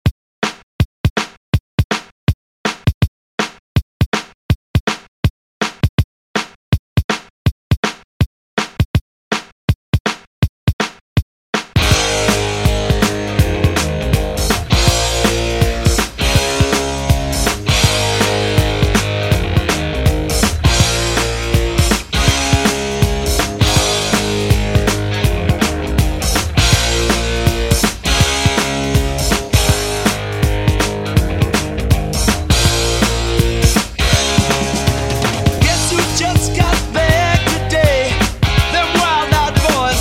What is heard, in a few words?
70s, 162 Bpm; Redrum